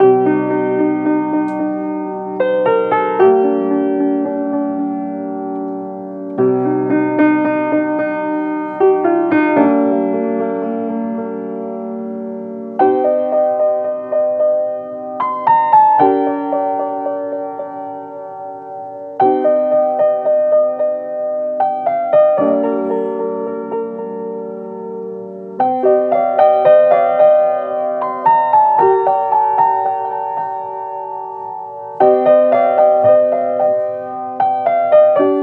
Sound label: 75 BPM G Min, Piano; Feeling; Cymatics; Bloom Piano Compositions